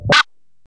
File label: pickup